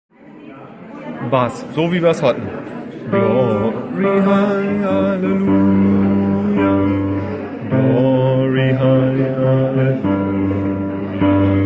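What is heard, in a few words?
Glory halleluja bass, gospel choir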